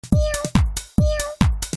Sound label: two meows